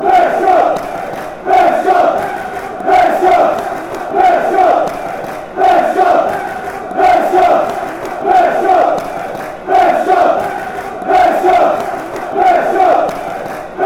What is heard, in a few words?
claps